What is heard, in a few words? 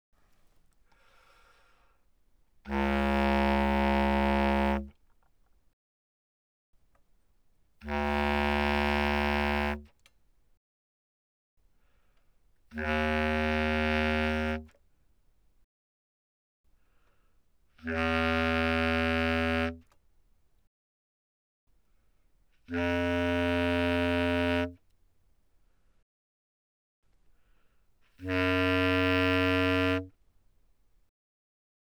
Bass Clarinet ff Db2 B2, woodwinds